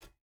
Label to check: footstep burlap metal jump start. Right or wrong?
Right